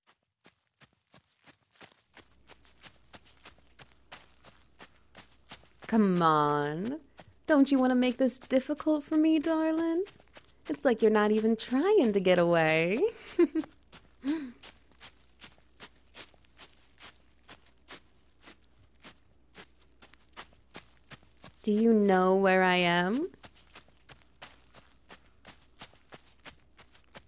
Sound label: Wet Noises; Playing Prey